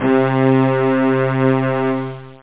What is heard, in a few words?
Violin Strings; Amiga 8-bit Sampled Voice; 8,363 sample rate